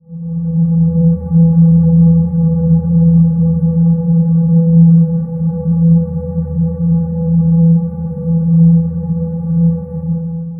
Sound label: Gentle Reminder Atmosphere E 145 BPM, Psytrance